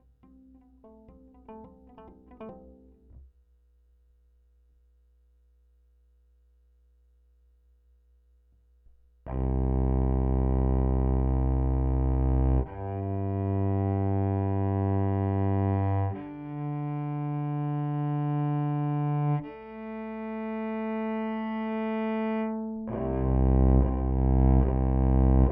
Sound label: pickup direct